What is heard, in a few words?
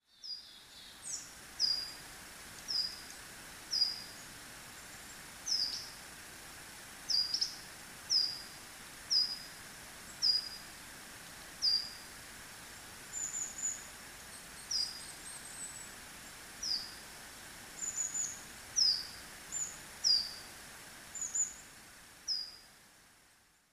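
higara, Coal Tit; chichibu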